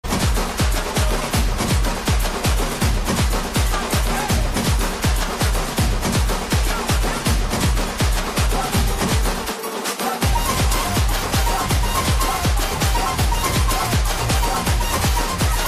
song bgm ringtone; thalapathy vijay

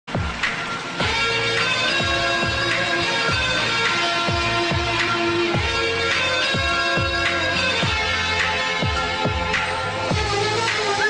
climax ringtone; galipata; by nkr

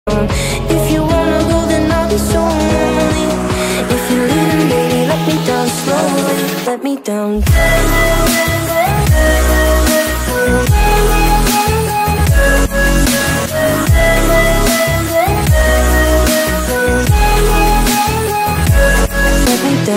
instrumental ringtone bgm

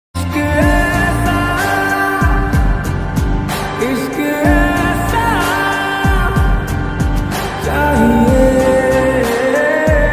song ringtone romantic love ringtone; ranvir kapoor; rashmika

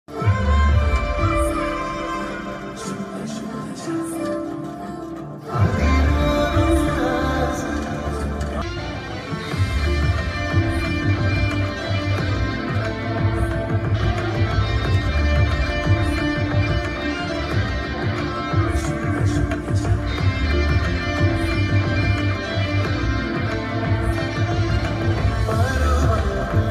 love bgm

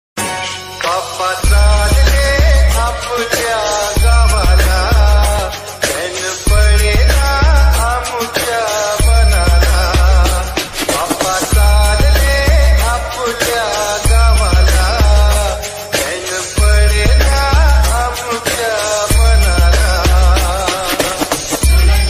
Ganpati visarjan ringtone

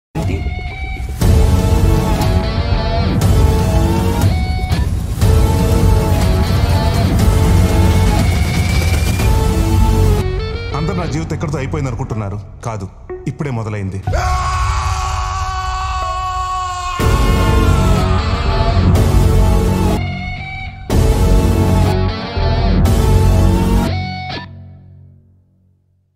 bgm thunder beats

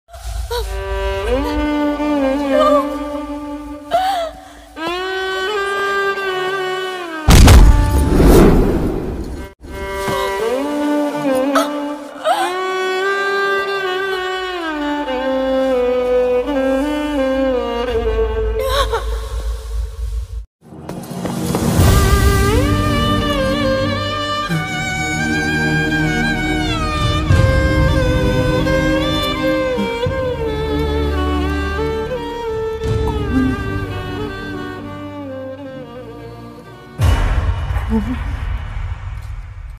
sad love bgm violin music; iddarammailatho movie

